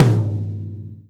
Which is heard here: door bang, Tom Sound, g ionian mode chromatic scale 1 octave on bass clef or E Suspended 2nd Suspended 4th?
Tom Sound